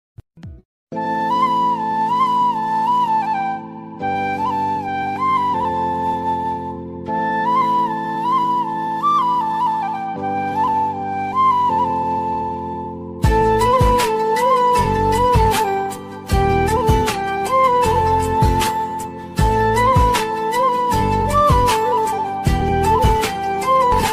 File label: flute ringtone, bansuri ringtone, south bgm ringtone